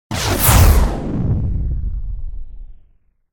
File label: Explosion Sci-Fi Explosion - Whoosh Explosion; Hollywood Edge; sound designer's toolkit v2